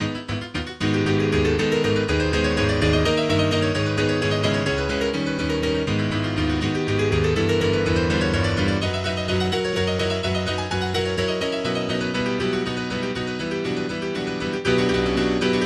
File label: Prelude in G Sharp Minor